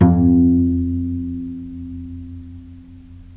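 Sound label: VIOLE PIZZICATO F2, Historical Instruments; USB Soundscan vol.23; AKAI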